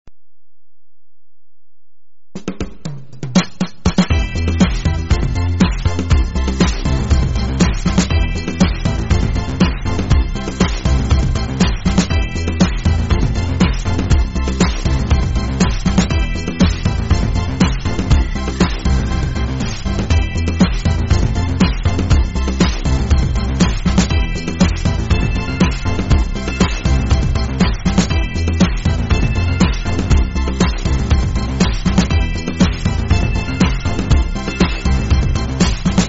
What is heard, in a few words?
Pop